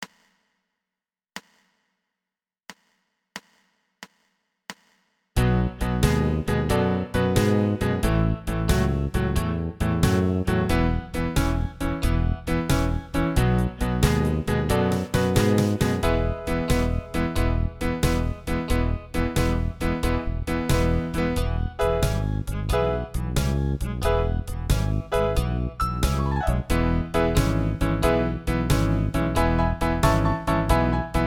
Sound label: Guitar Club Blues